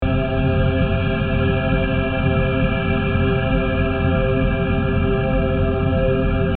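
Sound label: Ambience Reactor Room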